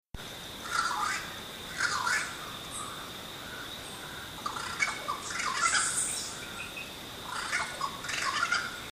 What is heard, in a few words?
corvus jamaicencis; windsor jamaica; West Indian